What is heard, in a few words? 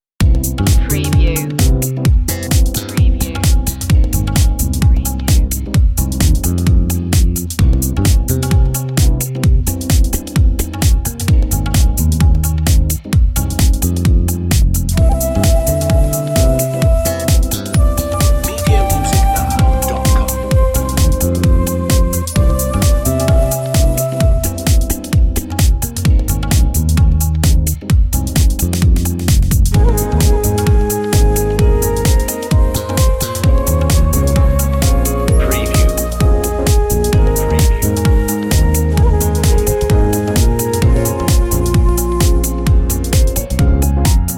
ethnic night life